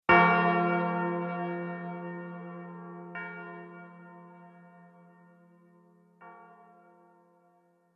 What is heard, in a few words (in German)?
glocke ton massage